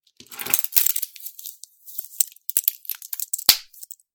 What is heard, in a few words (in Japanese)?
時計をつける